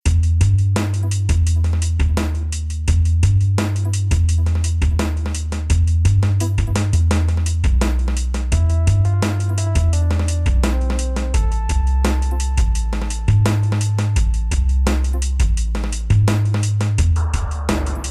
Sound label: Load Loop; The Sims 2; PSP